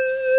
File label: ufo; tothemoon!